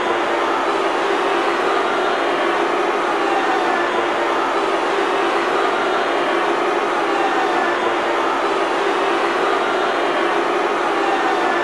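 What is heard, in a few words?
Basketball Crowd Boo Loop; Crowds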